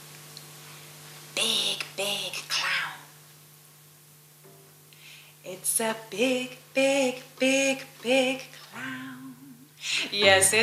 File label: Vocal and backing